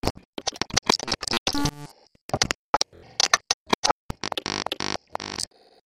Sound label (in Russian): kliki haotichnie udari blizko